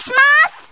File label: jump; kc shimakaze 22